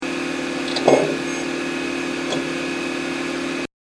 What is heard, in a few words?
hdd noise